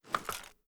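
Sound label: weapon foley assault rifle reload empty fast raise; gmod; viper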